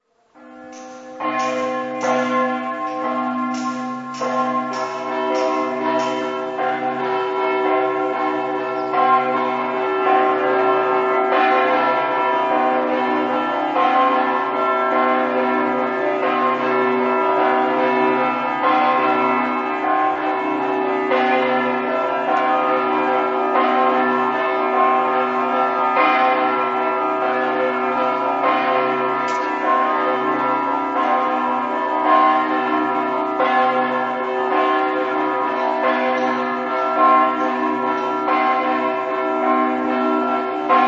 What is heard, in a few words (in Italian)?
campane